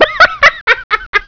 suonerie persone risate

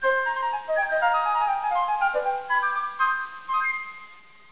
musica newage

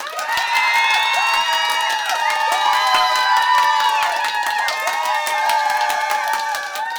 applauso, applausi, persone

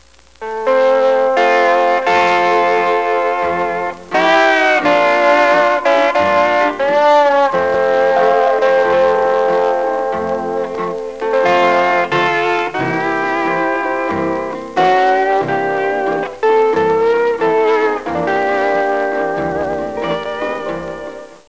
suonerie musica hawaiian

